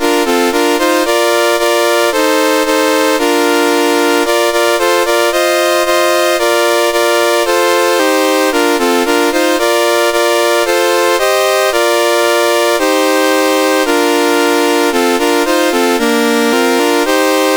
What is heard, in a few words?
Transkei, National Anthems, CSG 8580; CSG8580R5